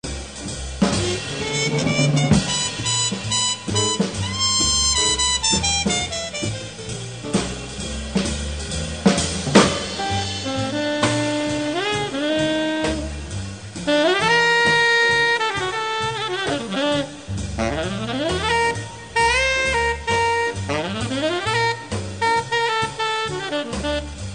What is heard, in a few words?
Solo